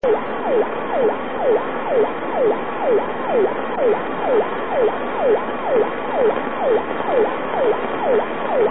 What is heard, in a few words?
relay